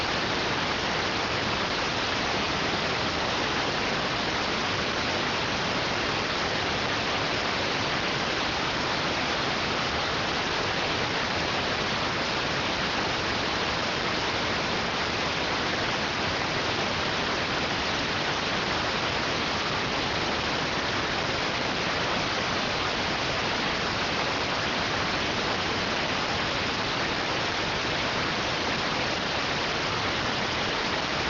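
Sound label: radioteletype receive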